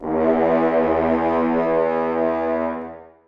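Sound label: horns sustain e3; Sonatina Symphonic Orchestra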